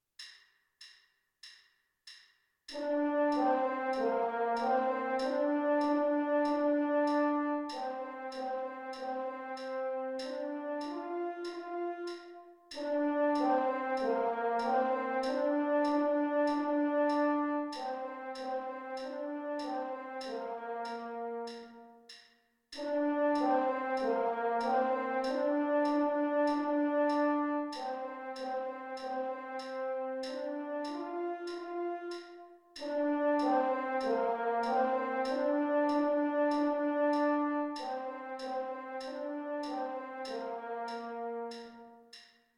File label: french horn